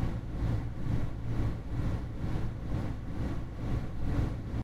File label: Ventil Rotate; Game rip; MiSide